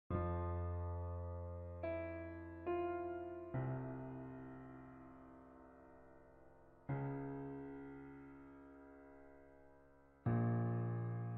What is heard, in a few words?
piano cover; Warframe - Revenant Prime Trailer Theme